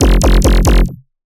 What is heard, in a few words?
Dirty Bass F 140; One-Shots; Bass Hits (Dirty); Squid; Ghosthack - Color Bass Essentials